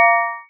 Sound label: blacksmith hammer